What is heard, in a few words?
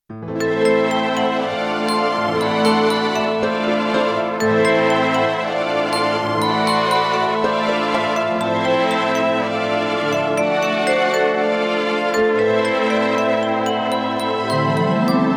Mystery Sound